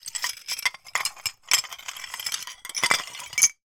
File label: foley broken dish pieces movement; zapsplat